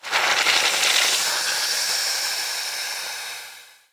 acid spit

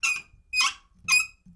blue valves off; doctorwho1200

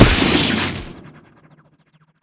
flight turn; p00gie; tardis

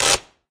handbrake on; doctorwho1200

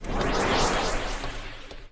power on, tardis; drmatt

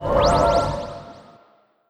door unlock, tardis; p00gie

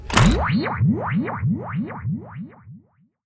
slider on; p00gie

